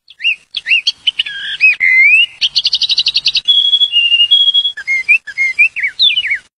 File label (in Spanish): sonido del bosque periquitos pajaros; tono de llamada naturaleza para celular